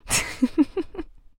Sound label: laugh, Voice Human Male